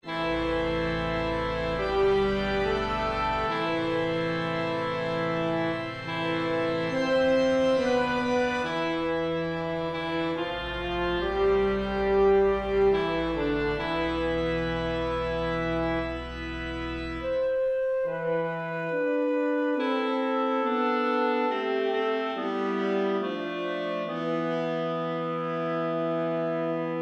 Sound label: Agnus Full; stmarysbruton